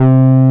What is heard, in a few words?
TB C5, TB-303; Masterbits; Vintage Voltage